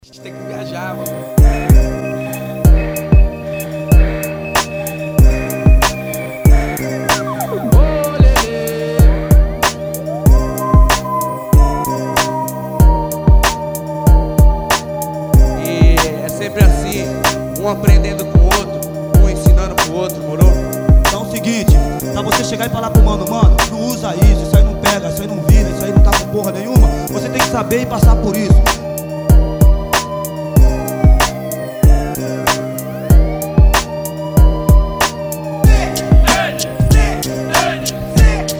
instrumental

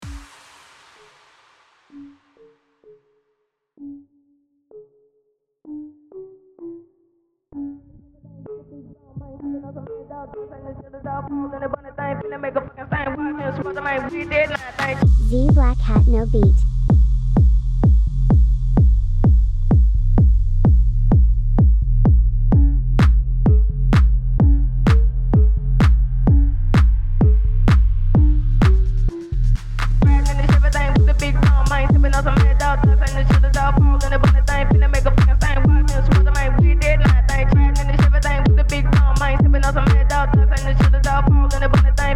phonk house

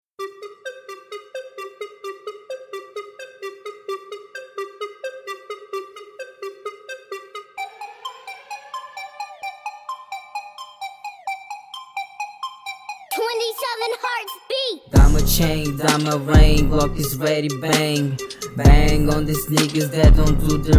trap

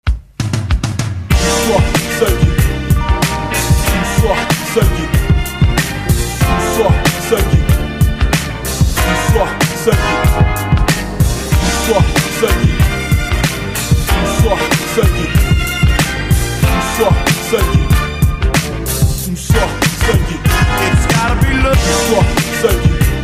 beat

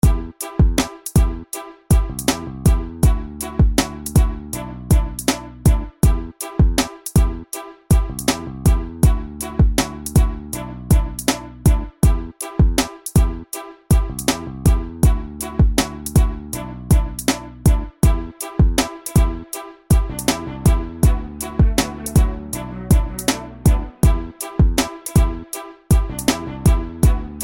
violinals

